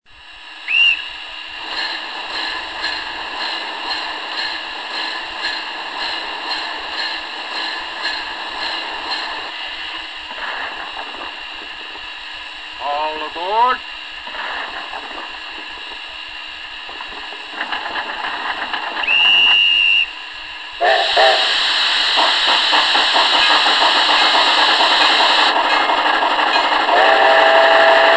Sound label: DL Mikado US, loksound; ds3